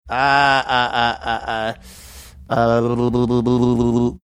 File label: series of funny sounds